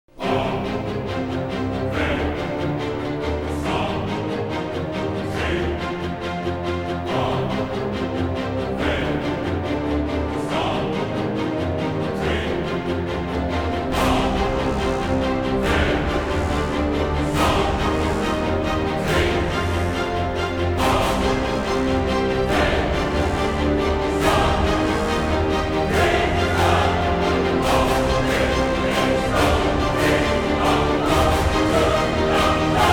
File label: Orchestra And Choir Only